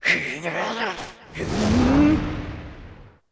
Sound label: socerer death